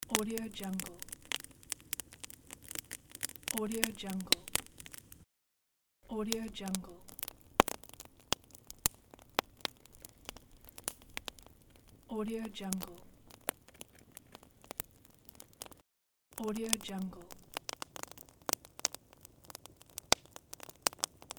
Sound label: bonfire